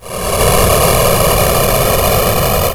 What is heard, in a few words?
floating; AR Shooting Unity